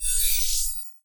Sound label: menu, button